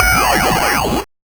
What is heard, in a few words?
Noise, 8-bit, Bitty Noises